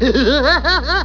Laugh; Krusty

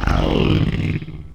16-bit Demon Growl Beep

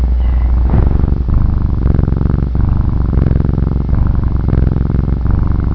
purr

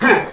pimp slap

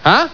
huh

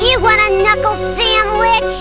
knuckle

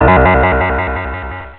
new game over

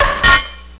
clank

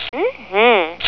mmm hmm

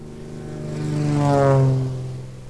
Plane

